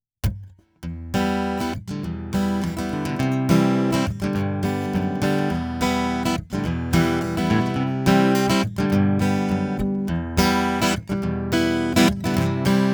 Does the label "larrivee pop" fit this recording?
Yes